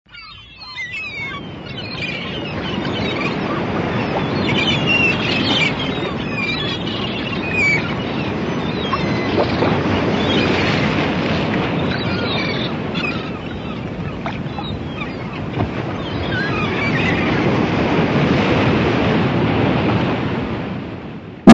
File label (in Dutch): Waddenzee; The Netherlands